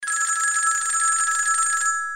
American Phone

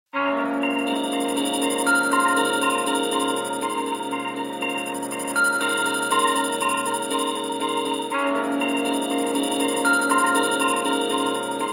Morning Bliss Alarm

